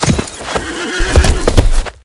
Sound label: rear, horses; CosmicRage